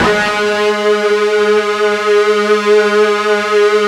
FUZZ G#2, Guitar, LOOP; ULT FZZ; East West - Steve Stevens Guitar Samples Collection

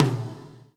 GATE TOM, Drums Acoustic

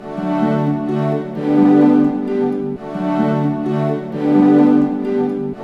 HAPPY, ORC Happy Hour; East West - Scoring Tools

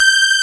Matrix Strings G5; Proteus 2000; Multisamples; Digital Sound Factory Proteus 2000

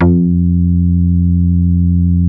F3, DIGITAL BASS; GENESIS; Music Store - Claudius Diemeter Schwerlos

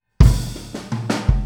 Boogaloo - Kick, 82 BPM; Drums; Intro; Sonic Foundry; Drums from the Big Room The Mixes

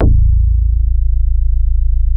A1, DIGITAL BASS; GENESIS; Music Store - Claudius Diemeter Schwerlos

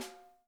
snare; Sonic Reality; Interactive Drum Kits

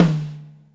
tom, Drums Acoustic; USB Soundscan vol.10; AKAI; DYNAKIT 1

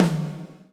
GATE TOM, Drums Acoustic; USB Soundscan vol.10; AKAI; 90s sample CD; GATEKIT 3

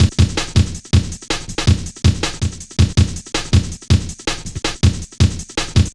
boom, Drum Loops; Jungle; Boom 161.5; Zero-G Jungle Warfare Complete

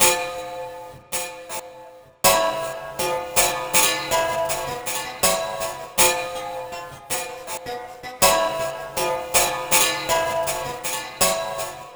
Plucked String; Sonic Foundry; Loop Noir; Eternal Torment

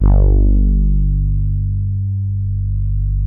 poly hard G1, soft brass; Music Store - Claudius Diemeter Schwerlos

